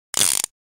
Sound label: gun